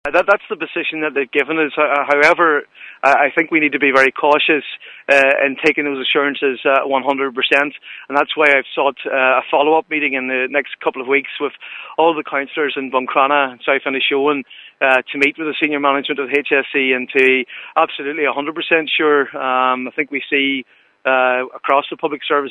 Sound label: pad